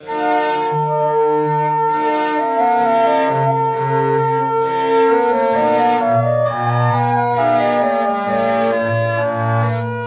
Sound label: aria loop slow; Light Fairytale Episode 1; macos ps4 switch windows xbox one gamerip 2019